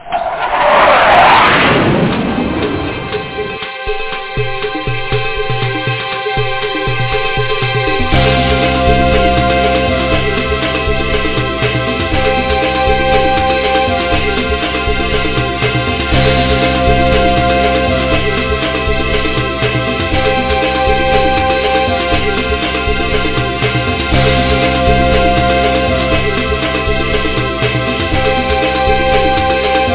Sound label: takeoff, hot jets